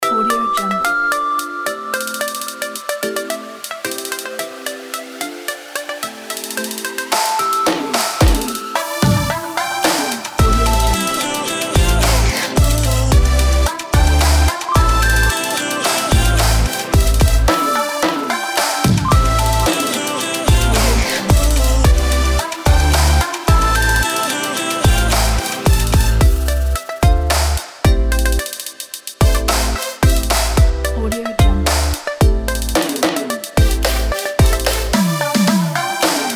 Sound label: future bass; nazart